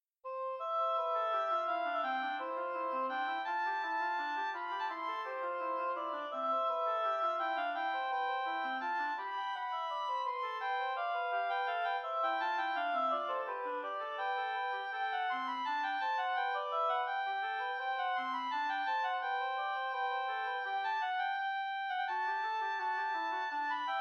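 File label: air in C major, 2 oboes; hwv 597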